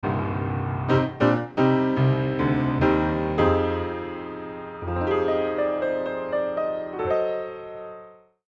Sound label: Multimic Facets Piano Full Mix